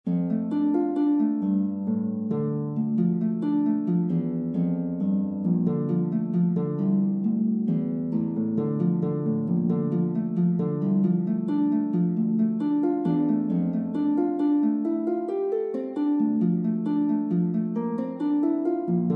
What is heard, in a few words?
courante, lute